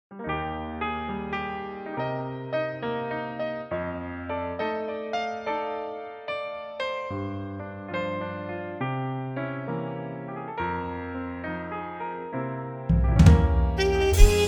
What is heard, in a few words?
Eb trumpet mute; casablanca